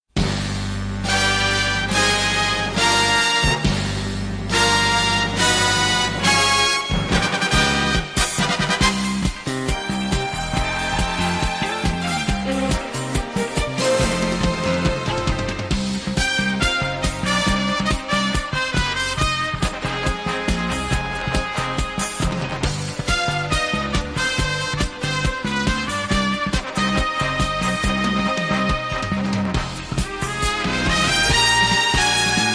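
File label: western police, 着信音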